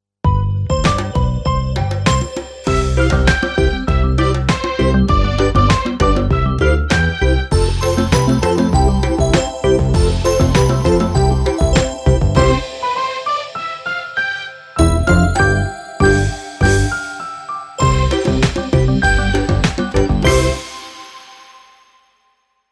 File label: Intro POP